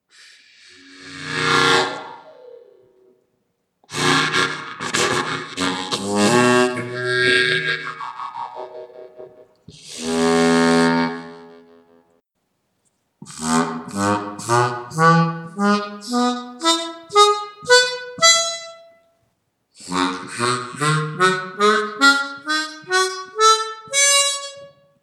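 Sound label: lick example, harmonica; Seydel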